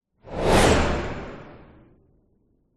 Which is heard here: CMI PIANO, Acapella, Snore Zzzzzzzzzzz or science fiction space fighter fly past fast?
science fiction space fighter fly past fast